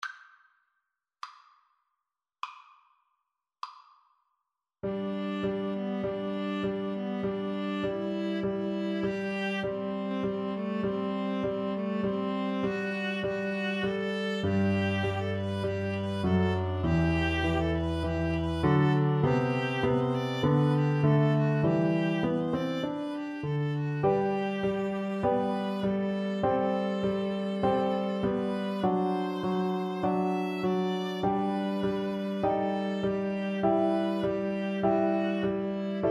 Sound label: viola viola